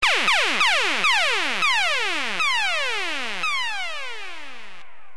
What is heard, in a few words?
Sms Pulse Tone